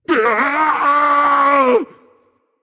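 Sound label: non verbal fear, voice; cernunnos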